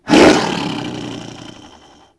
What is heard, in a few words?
Roar, Dino; Styrac